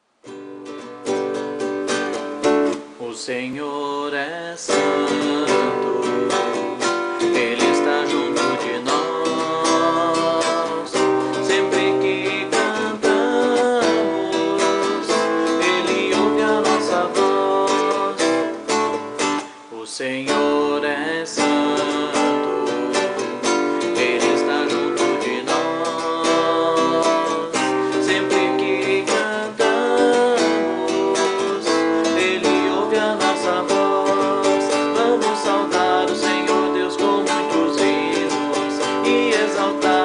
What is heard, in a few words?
vocal